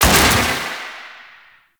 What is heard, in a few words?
Weak Spot Vent Open; Warframe; Sargas Ruk